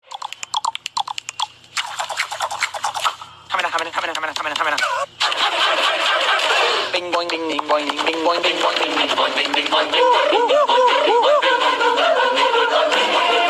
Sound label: bing boing